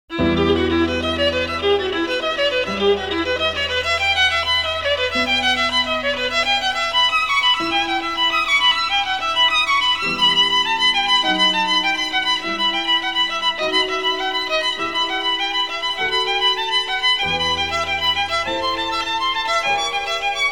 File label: Allegro, violin; Suzuki Violin Method; J.S. Bach